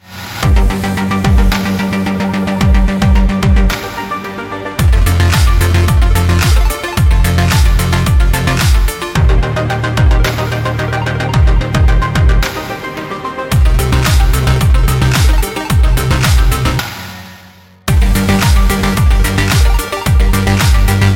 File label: Electro Dance